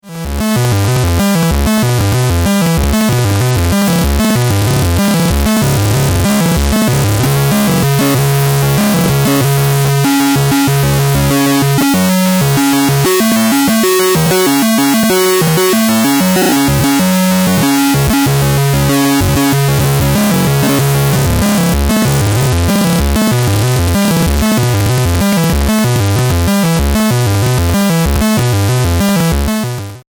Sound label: a111 sync, modular; 2台のA-111の矩形波同士によるソフト・シンク